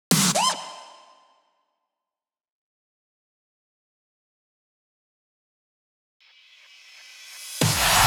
Big snare loop, Big EDM Drum Loops 128 BPM; Future House; Future House Tools

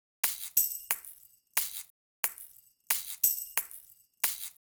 Percussion, 090 Cmin

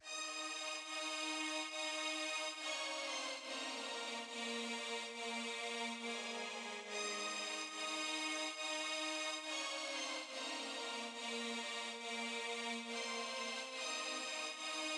140 BPM D# Min Strings; Cymatics; Destiny Gold Expansion Stems; Hall Of Fame

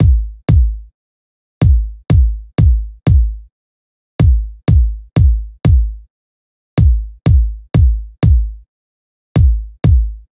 Kick, Drum Tracks, Amin; Hip Hop; big fish audio; Hip Hop Exotica; Apple Loops

